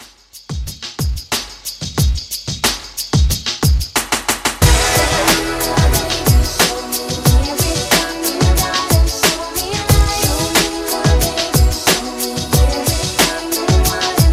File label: 90s Pop Re Drum 91 BPM